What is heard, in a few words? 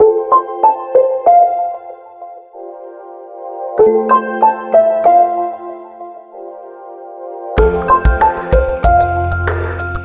rise alarm